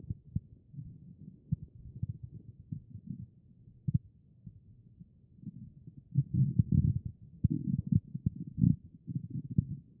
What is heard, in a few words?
HEART; B MODE